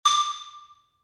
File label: tone